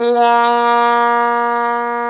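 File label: brass plunger trumpet, plunger mute; Plunger & Wa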